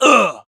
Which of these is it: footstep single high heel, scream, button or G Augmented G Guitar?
scream